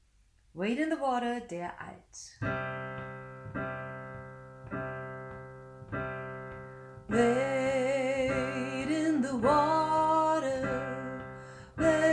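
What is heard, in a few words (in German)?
Wade in the water alt, gospel choir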